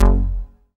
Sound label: K-2000, Synth Bass